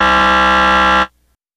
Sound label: Buzzer Elect Game S, electronics